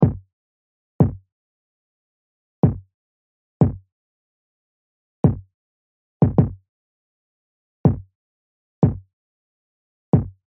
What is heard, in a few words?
Kick Loop